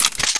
mp5 clip in; svencoop

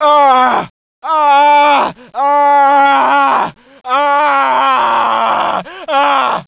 new scream; svencoop

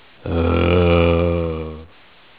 zo idle; rezombie; svencoop

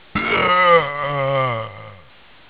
pain, zombie; rezombie; svencoop

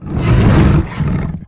devour complete; balathron; svencoop

